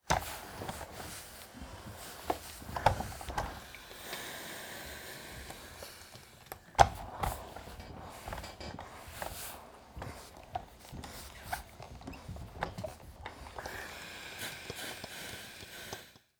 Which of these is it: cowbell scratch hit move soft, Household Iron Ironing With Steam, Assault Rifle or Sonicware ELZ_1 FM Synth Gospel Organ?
Household Iron Ironing With Steam